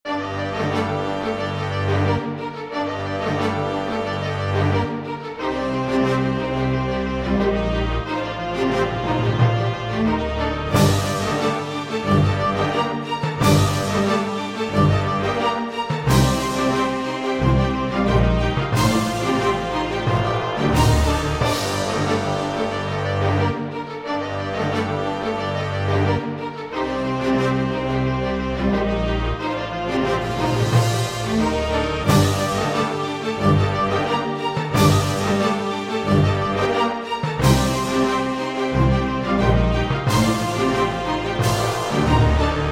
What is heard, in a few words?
Dogma, Jingles; iLife Sound Effects